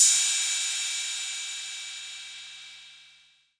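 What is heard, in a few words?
808 cym, cymbal, drum machines; 808 Extended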